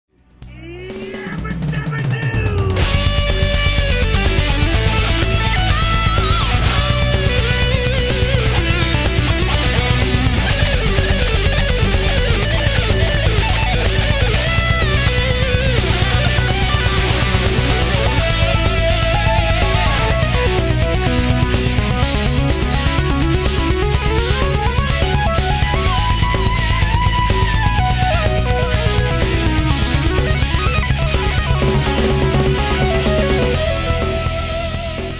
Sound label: Childhood Solo